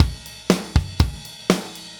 120 Solid Rock Drums, Fast Tempo; Pop Rock; Digidesign; Pro Tools; Pro Tools Essential Loops

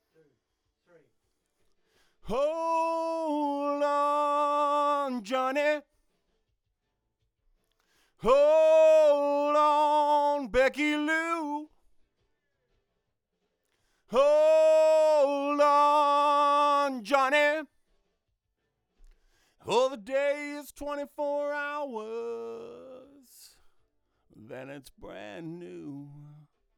Vox

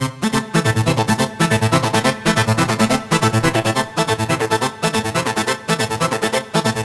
140 House Arp Amin; Dance; DJ; Synth; Arps; Pro Tools Essential Loops; Digidesign

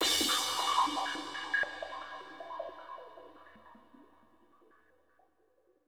156 Techno Crash Fx; Dance DJ; Drums; Cymbals; Digidesign; Pro Tools; Pro Tools Essential Loops

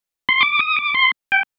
R&B Organ Cm; R&B Funk Soul; Digidesign; Pro Tools; Pro Tools Essential Demos; Essential Loop Demos; Demo Session

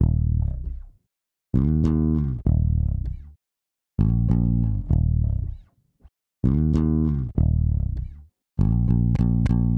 098 World HipHop E-Bass Emin; Real Bass; Digidesign; Pro Tools Essential Loops

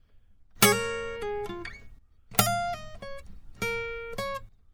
101 Pop Ac-Guitar Dbmaj; Pop Rock; Acoustic Guitar; Pro Tools Essential Loops; Digidesign

